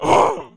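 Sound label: female zombie hit; Project Zomboid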